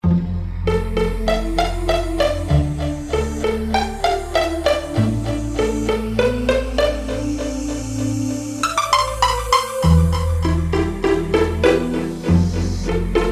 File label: Roland JD-990; Factory Patches examples